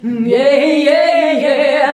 VOX, Singin

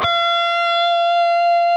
PRS F#, Guitars, LEAD

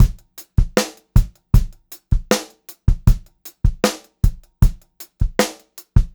78 STR BEAT, Funk-Groove Drumloops; USB Soundscan vol.38; AKAI

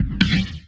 Kick Snare; Esoterik Beatz; Saver Kit

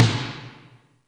Snare, Alesis D4fx

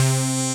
SYN C, BUZZ SYNER

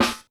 HIT, SNARES; SW SNARES; Sampleheads; New York City Drumworks VOL-1

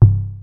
Bass Drum, Cwejman S1 MK2, Drum Machines

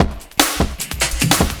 Beat, Futurist Drum & Bass; Drums & Percussion

